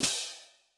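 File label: Cymbal Open; Yamaha DTXpress-II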